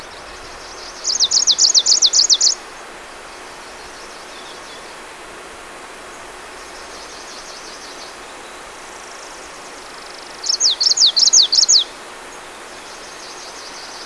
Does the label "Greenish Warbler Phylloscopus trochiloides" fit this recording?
Yes